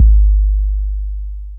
Kicks; Heartbeats

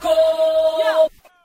"oh" sung

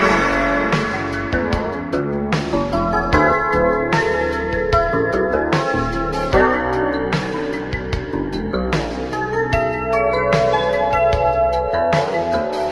vapor waves, Electronic Loops

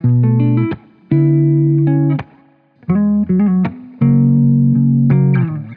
guitar, Loops

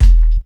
Kicks; Heartbeats

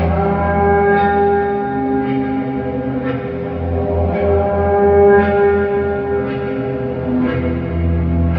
strings, Sad Loops

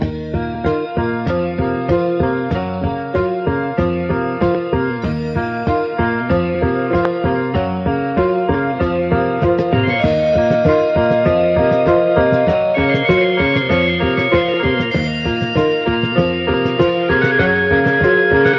piano and flute; loops; Phonky

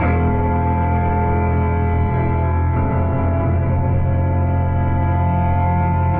strings, Sad

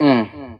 mmm

